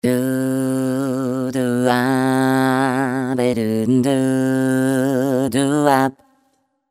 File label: Elastic octave down